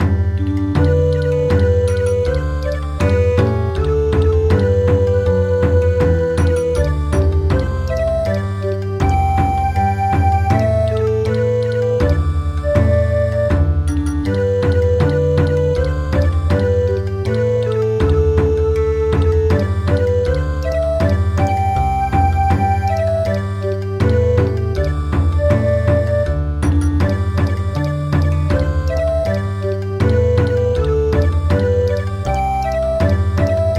Bon Odori

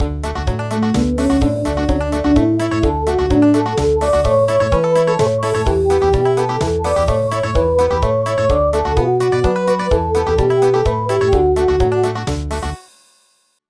Country

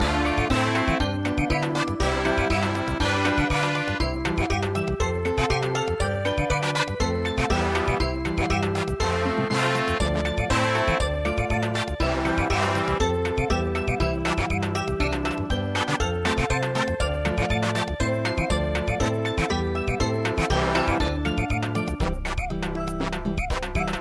kimigayo samba

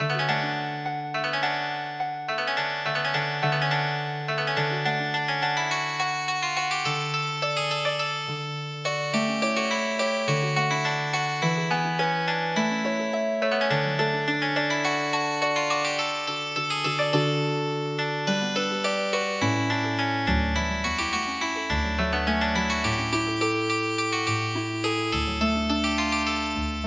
Healing Harp; AmericanPatrol

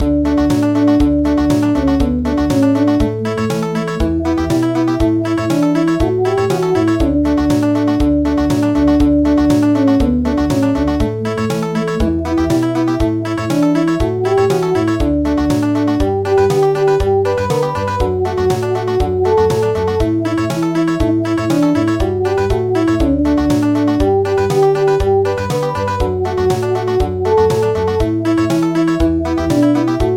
Country; Anak kambing saya

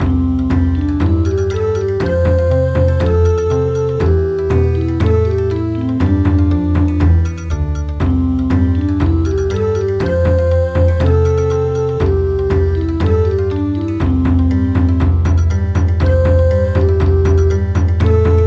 Bon Odori

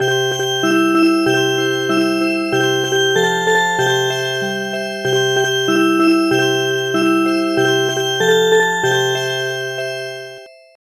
Music Box, traditional; Sleep my treasure

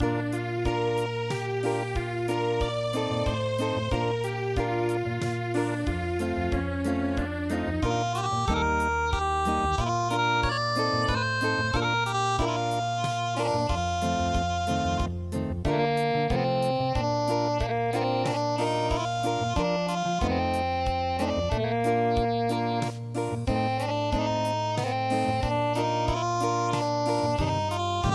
Acoustic

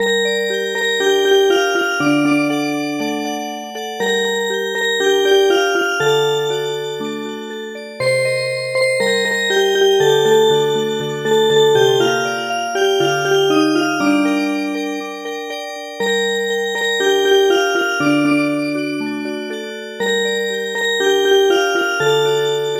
Music Box

